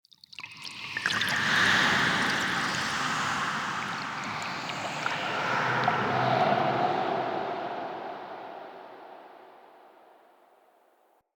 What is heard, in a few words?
trippy breath, ambience; Soul Plane